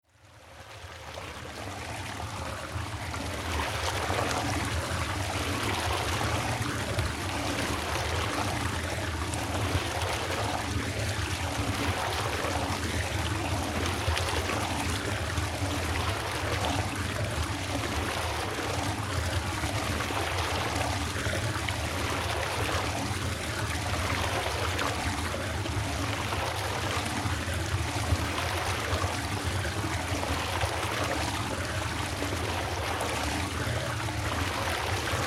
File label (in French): Eaux Etangs